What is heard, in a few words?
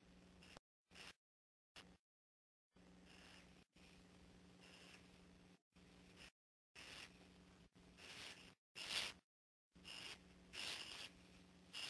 Spitting up a pellet